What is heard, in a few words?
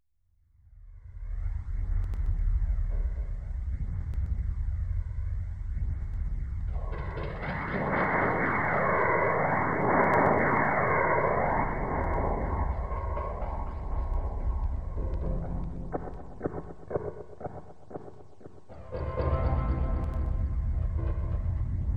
A Lydian 60, Deconstruction